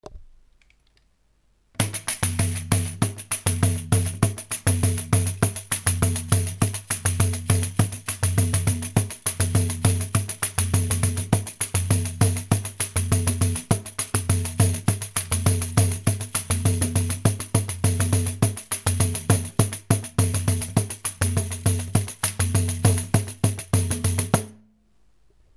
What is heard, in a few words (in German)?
Klangbeispiel Pandeiro; Urubatan